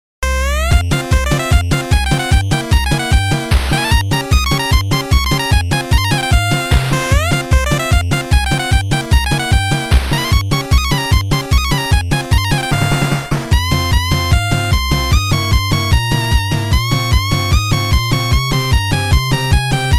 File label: 8-Bit